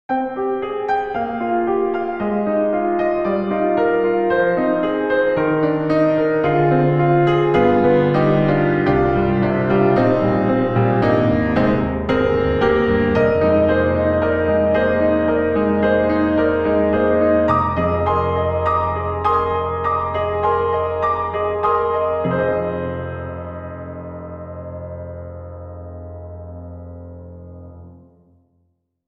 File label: Virtual Grand Piano; Film Score; Art Vista; Virtual Grand Piano 3